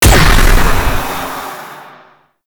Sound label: Stun Burst; Warframe; Stalker